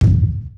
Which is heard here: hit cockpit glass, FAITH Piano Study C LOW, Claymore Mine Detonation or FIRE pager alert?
Claymore Mine Detonation